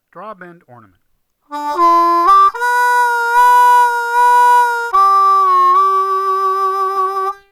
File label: Draw Bend Ornament